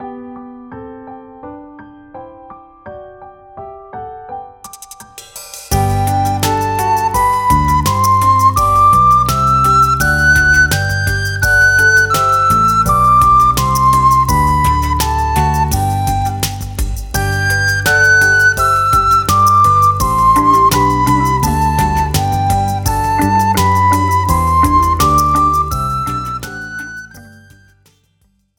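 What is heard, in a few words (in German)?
Die Tonleiter G-Dur, Flötentöne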